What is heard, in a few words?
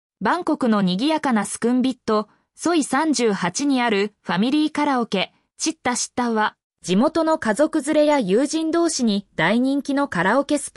karaoke